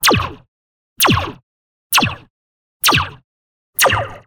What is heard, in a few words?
Lasers Slinky; noise creations